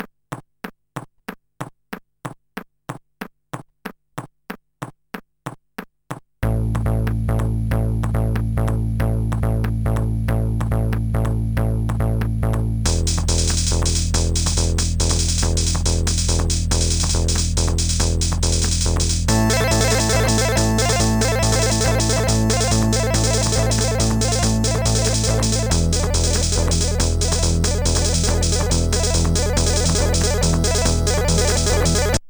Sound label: rhythm test; MusicLine